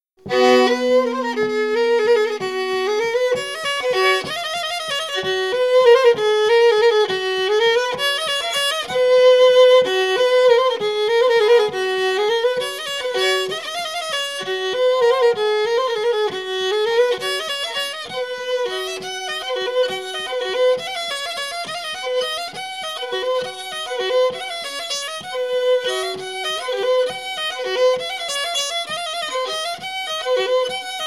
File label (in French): Valse À La Chabrette